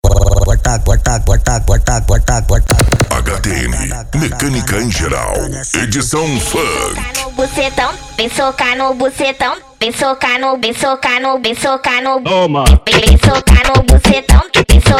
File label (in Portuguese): mecanica em geral edicao funk